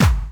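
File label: snare drum bass drum, Dance Mega Drums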